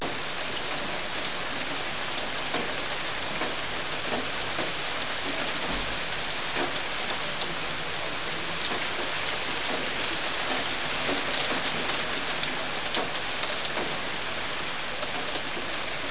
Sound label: rain; cs poltergeist